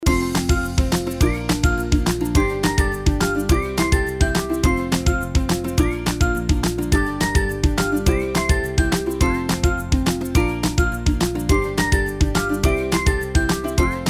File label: BGM; 5th Anniversary; Blockman Carnival